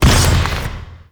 Ground Spike Burst; Zariman; Void Angel; Abilities

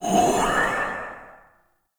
Fire Whispers; Weapons; Warframe; Tenno; Grimoire

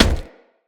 Crewman Footstep Run; Characters; Corpus; Crewmen; Footsteps

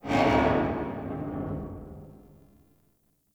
Parking Lot Metal Door Distant; Warframe; Ambience; Nineteen Ninety Nine

